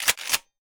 rem870 pump, shotgun; weapons; garrysmod

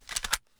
de mag in, pistol deserteagle; garrysmod

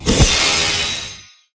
spell steal; cstrike; war3source; spellbreaker

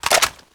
rpk mag out, weapons; garrysmod

